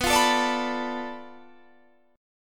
B Minor 7th Flat 5th, Guitar, Bm7b5 chord; Bm7b5 strummed; 19,20,19,19,22,19; JGuitar

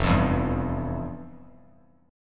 F Major 7th Suspended 2nd, Bass Standard; strummed; JGuitar

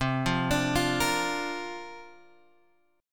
C 9th Suspended 4th C, C 9th Suspended 4th chord; C9sus4 Chord {8 8 X 7 6 6} arpeggiated

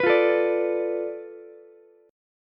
B Minor 7th Flat 5th B Ukulele; Bm7b5; strummed; JGuitar

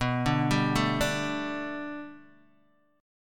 B Minor 7th Sharp 5th B Guitar DADGAD, chord

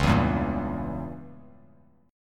C sharp Diminished 7th C sharp down Bass Standard; C#dim7 strummed; JGuitar

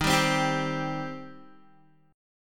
Eb 6th Eb down Guitar; Eb6 strummed; JGuitar

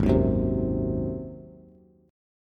G sharp 7th, Bass; strummed; chord; JGuitar

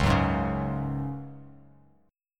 Db Suspended 4th, Bass Standard; 9,9,6,6; strummed

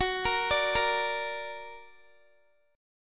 Gb Augmented Guitar; Listen to Gb+ arpeggiated

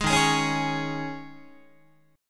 Eb 7th Suspended 4th Guitar, Eb7sus4 chord; strummed; 11,11,11,11,12,12; JGuitar

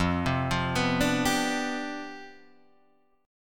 C Major 7th Suspended 2nd Suspended 4th F, chord

